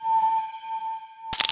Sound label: Retry Jingle; Friday Night Funkin Funki Funki Karaoke Club ost mod windows gamerip 2023